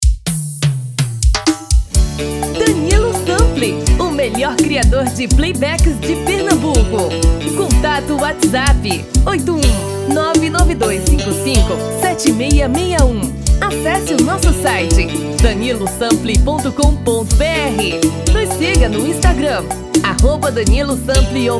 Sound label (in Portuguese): tom masculino